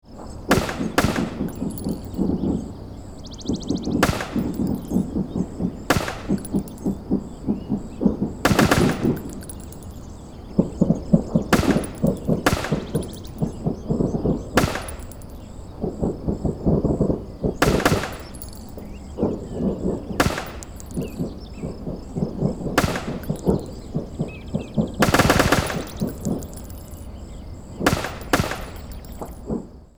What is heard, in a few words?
Intense mid range battlefield with bullet drops